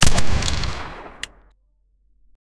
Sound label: cannon shoot; clash royale